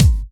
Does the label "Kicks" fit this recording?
Yes